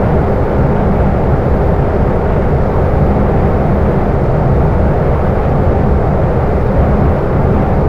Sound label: Plane Wing Turbulence In; DCS